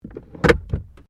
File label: Car Toyota Corolla Glovebox Close; 2009 Toyota Corolla hatchback; Vehicles; Cars